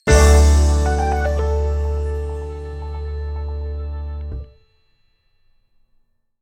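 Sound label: Finish Evening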